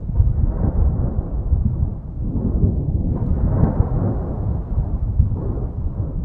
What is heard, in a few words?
dull thunderclap